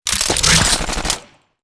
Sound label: Menu Install Mag; Custom UI Sounds; Call of Duty Black Ops Cold War